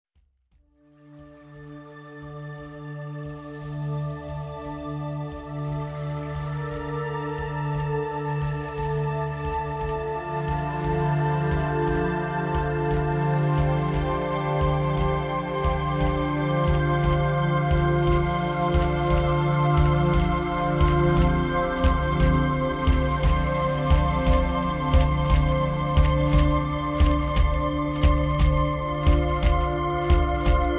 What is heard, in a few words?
drum spirit